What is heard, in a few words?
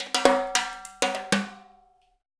TIMBALE; Spectrasonics; Liquid Grooves 1 & 2